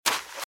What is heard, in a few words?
Footsteps Sand Dry Run Right; RadwinIsland